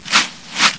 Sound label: brush